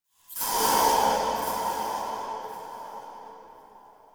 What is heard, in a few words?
Interior Texture High Vain Squeeze; Ambience; Indoor; Warframe; Infested Eris